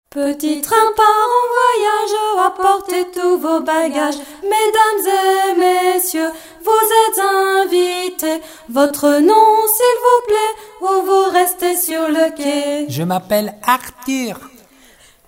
petit train chant

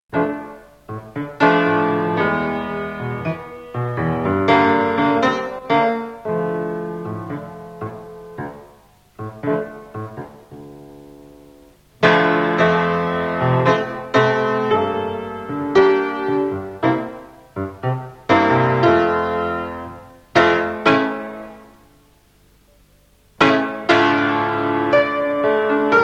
chat costaud instr

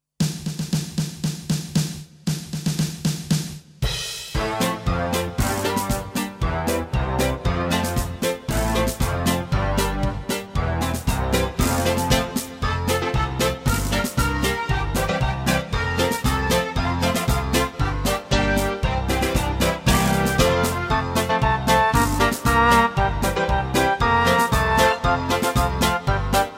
rats plapla instr

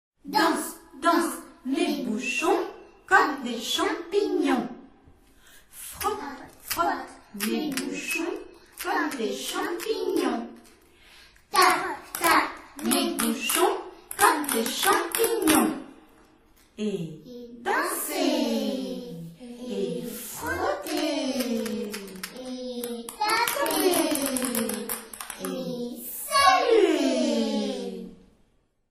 bouchons chant

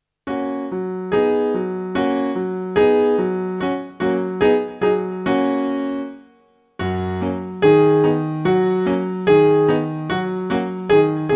pluie instr

